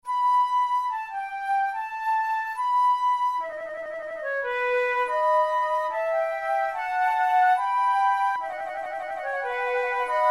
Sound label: sonate en trio, tendrement, flute